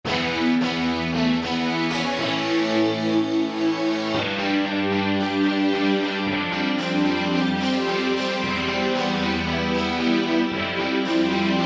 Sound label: Grunger, Generalmusic Equinox